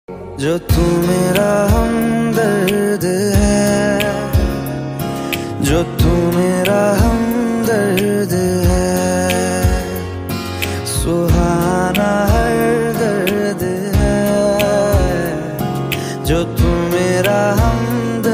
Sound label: ringtone music